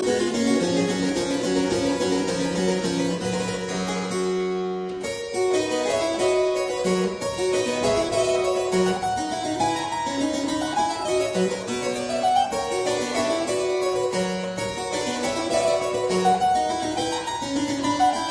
Keyboard Sonata

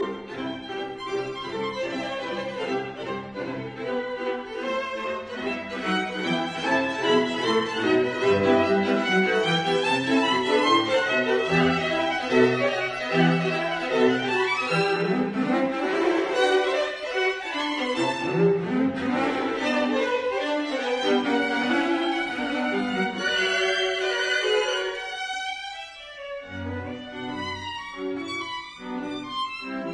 String Quartet No 8 in E minor Presto; Beethoven; Op 59 No 2; Rasumovsky

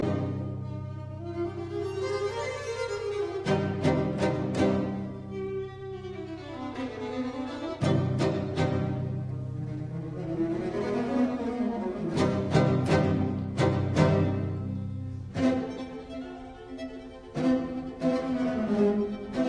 String Quartet in C major Vivace; Britten; String Quartet No 2 in C major Op 36

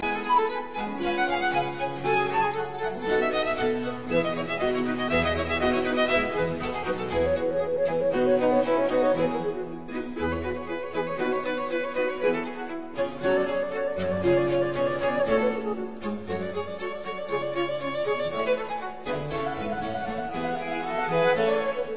Quatuors Quartet Allegro; No 2 in F major; TWV 43F1

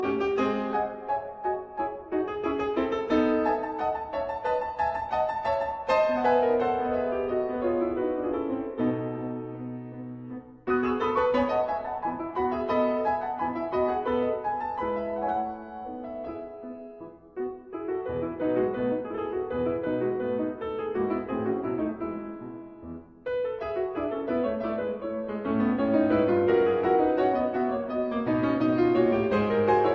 Rigaudon in E major; Rameau

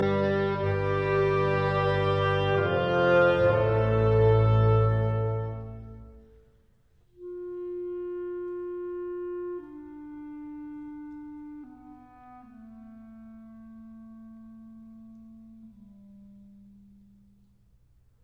Overture arr for wind ensemble; Weber; Der Freischutz

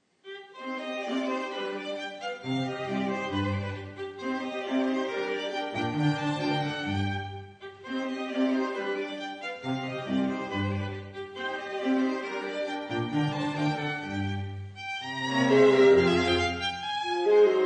Viola Quintet in c, Allegro

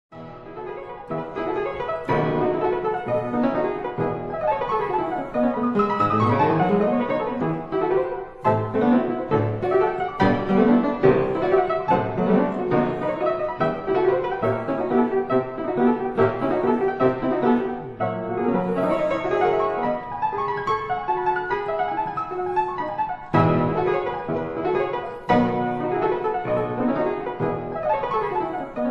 Etudes for piano, E major

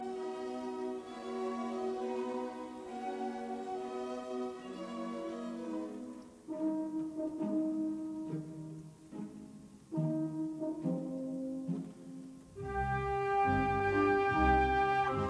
Symphony in E flat major, Andante quasi; Symphony No 4; WAB 104; Romantic; 1881 version ed R Haas; allegretto

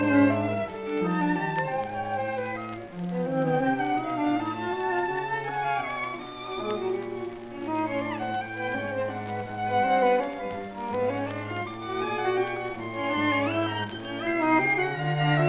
String Quartet Massig; Op 10; Schoenberg

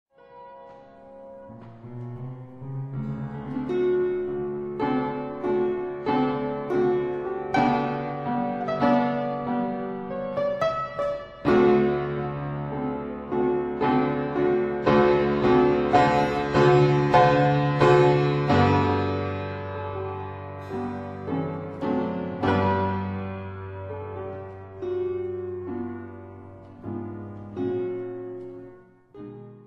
Keyboard Sonata in E major, Adagio Patetico; Amicitia; Op. 69

